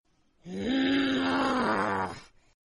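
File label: mood angry; fengnu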